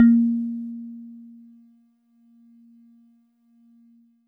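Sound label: Warble Celeste DX100 A#0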